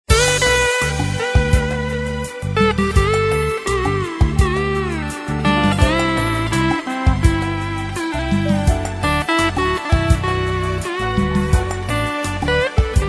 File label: Hindi Instrumental